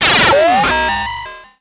Animation Electronic; Adobe; Multimedia Internet CD-Rom Flash

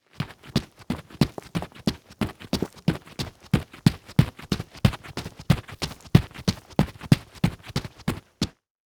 Foley Footstep Golf Cleats Run On Dirt; Foley Footsteps

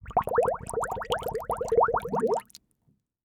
Liquid Water Bubbling Shallow Multiple to Single

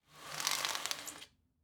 Household Window Blinds Pull Up

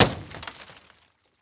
Impact Glass Smash

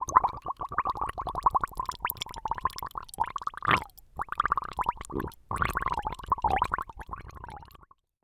Human Male Gargles Bubbles

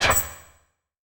Title Transition Electrical Zap; Adobe; Production Element

